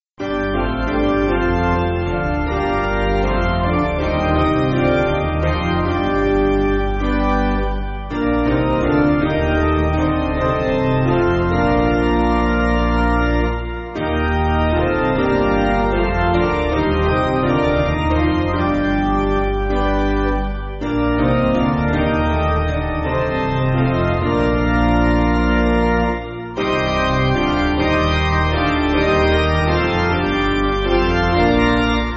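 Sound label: Piano, Music Backing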